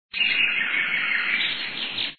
safari inserts

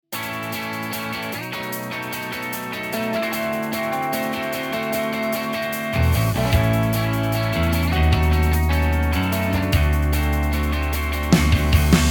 Brit Pop

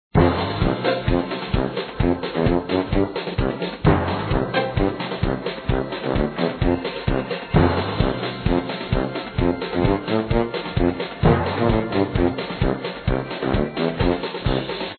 bigtop circus Song

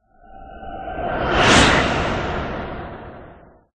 square hello sound

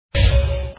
hatch door; tootlantis

